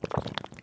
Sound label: slurp SLRRP